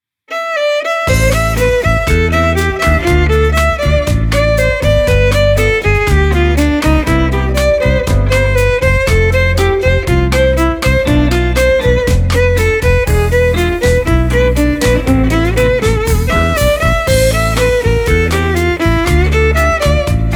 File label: passa bossa beat; handel